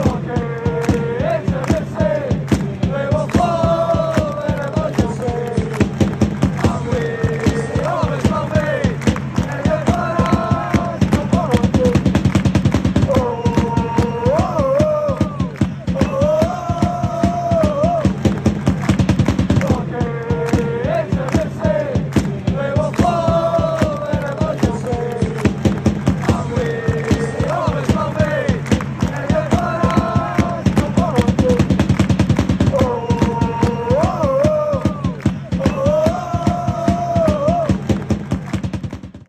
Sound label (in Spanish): gorgie hmfc, cánticos de fútbol de Escocia